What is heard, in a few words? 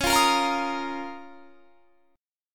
C sharp 6th Add 9th C sharp down; C#6add9; strummed